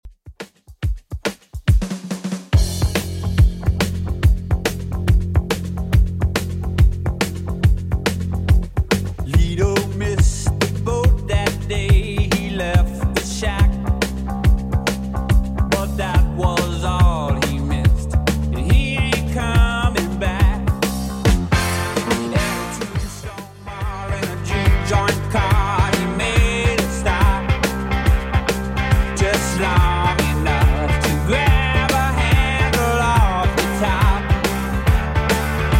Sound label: 70s Redrum 141bpm